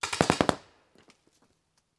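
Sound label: Paintball Gun Impact; Sound Ideas; Guns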